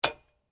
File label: SLEDGEHAMMER METAL IMPACT MEDIUM